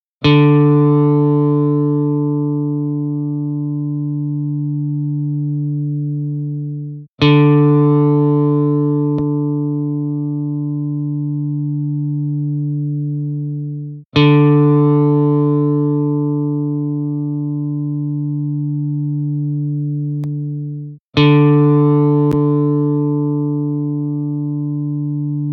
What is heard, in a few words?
D String